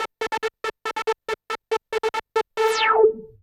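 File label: synth electro 140 A; Techno; Keys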